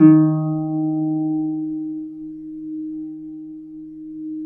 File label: EB2 HARP; USB Soundscan vol.62; AKAI